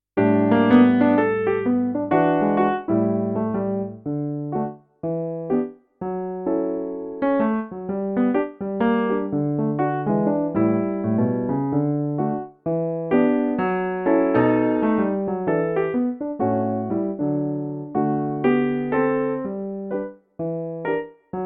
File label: piano, violin